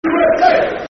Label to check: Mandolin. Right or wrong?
Wrong